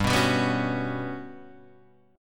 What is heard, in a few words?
G Major Sharp 11th G down Guitar Half Step Down